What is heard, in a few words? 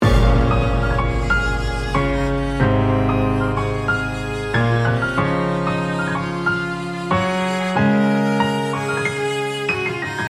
Instrumental